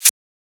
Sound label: Shaker; FLPRO